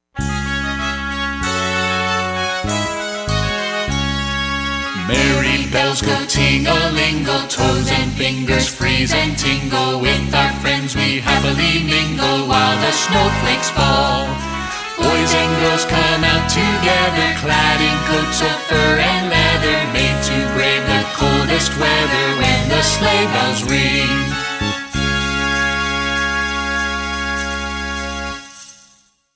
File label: Sleigh Bells; A Beka; Songs we enjoy 5a